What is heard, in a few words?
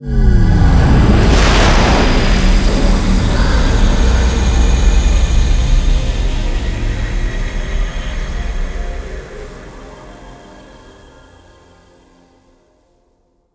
Pole Disintegration; moul